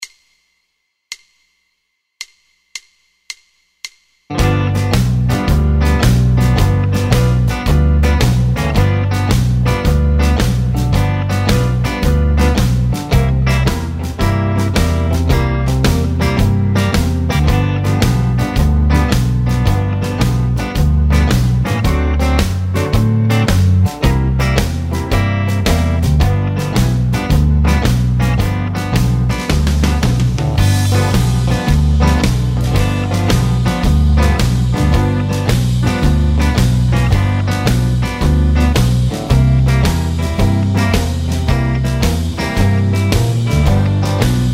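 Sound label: blues harmonica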